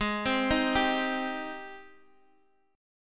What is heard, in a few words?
G sharp Major 7th G sharp, Guitar Half Step Down